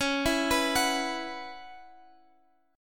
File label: Db Minor 11th Db down Guitar Open F; arpeggiated; Dbm11